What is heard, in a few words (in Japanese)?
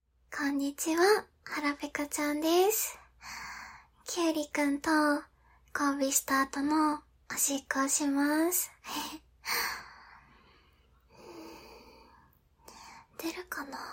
オシッコですッ！